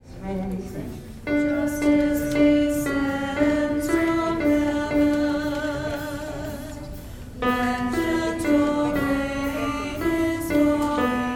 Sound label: alto